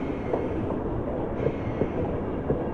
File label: rail; Mafie 1